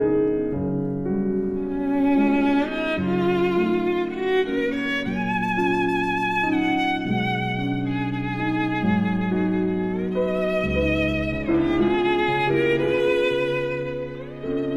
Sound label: for violin and piano; Estrellita (Little Star)